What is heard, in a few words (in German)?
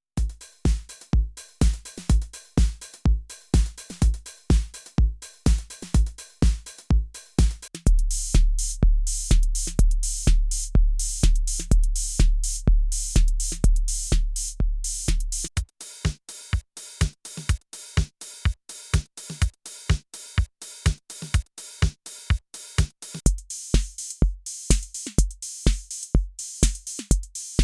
Roland DJ 808 TR S Modelle